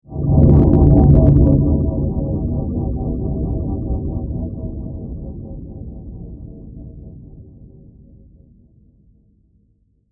Magnetic Ray, Science Fiction; 5.1

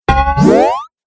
Cartoon Stunned Then Jumps, Bounce Sound Effects

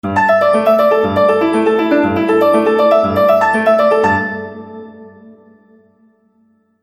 Bright Melody Mobile App; Musical

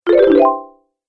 Classic Bonus Points, video game sound effects

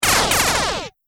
Science Fiction Laser Shooting, laser gun; Sci-Fi Laser Sound Effect

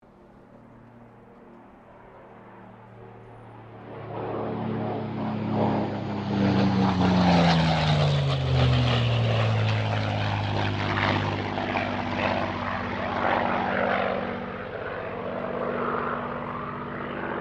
AIRCRAFT SUPERMARINE SPITFIRE MK-VIII PASS; Airplanes; Vehicles

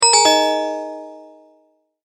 multimedia tone end

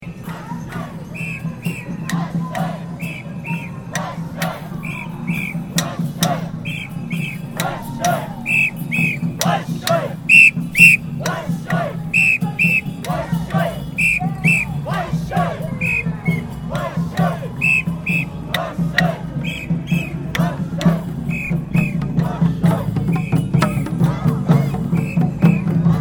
Ambience Melbourne Moomba Parade Japanese Group; Moomba festival Melbourne 2009; Parade Sound Effects; Foundation1

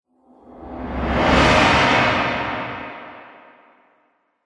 Dramatic Movie Riser; Sci Fi Sound Effects

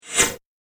Space Hatch Thin Metal Whoosh; science fiction sound effect; star wars / trek style games

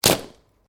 Branches Dead Snap

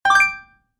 Great Idea, cartoon; Cartoon Sound Design Sound Effects

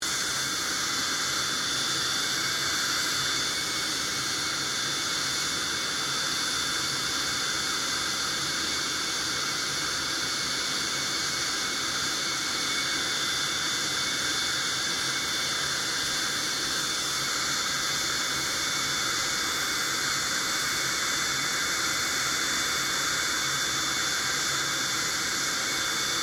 1997 BAE HAWK 127 IDLE; Airplanes; Vehicles

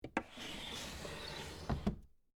Drawer Close Desk, Antique, Wood, Small; Foley; Drawers; BlastWave

